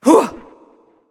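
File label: grunt attack, female; smite; voicepack; hera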